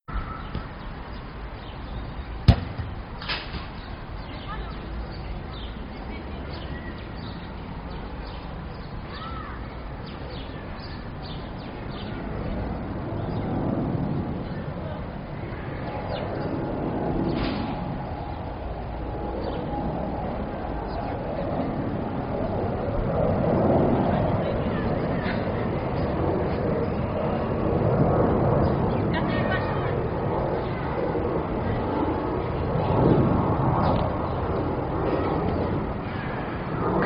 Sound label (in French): Son Avion Oiseaux